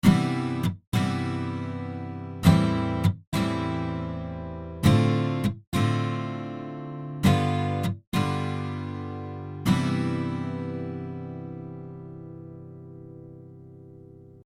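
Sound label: Guitar rhythm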